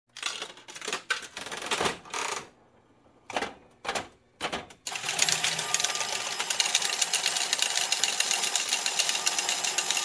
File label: Slot Machine Payout